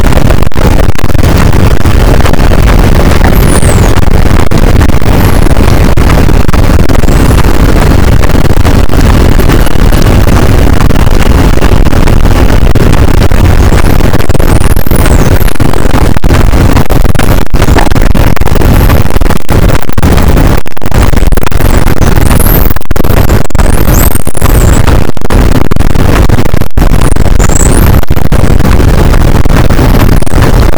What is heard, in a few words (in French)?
cachalot positif, boat; Physeter Macrocephalus